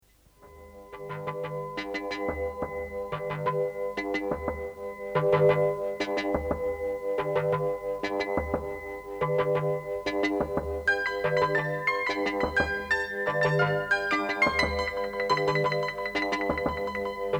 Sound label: ARP 7 piano diskant happy lys; ARP 7 piano ascended